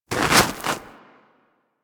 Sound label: Armadillo Move Snow